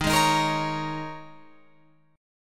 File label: D sharp 7th Suspended 4th, Guitar, Open F